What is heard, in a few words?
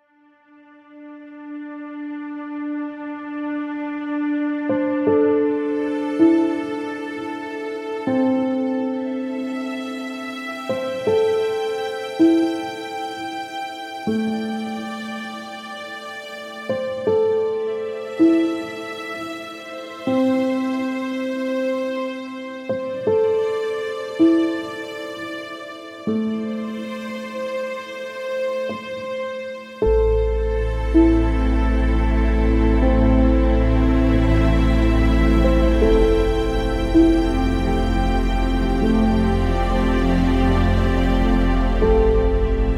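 background music without words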